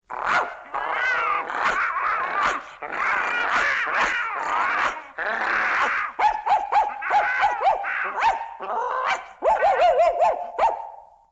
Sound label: dog n cat fight; Animals